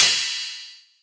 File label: Eucalyptus Ride, Dubstep Cymbals; One-Shots; Drums; Ghosthack; Ghosthack - AC2024 - Day 13 - Dubstep Sounds 2025